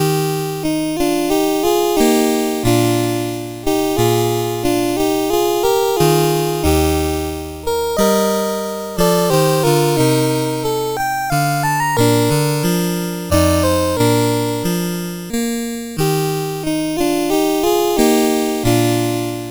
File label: Andantino in E Flat, CSG8580R5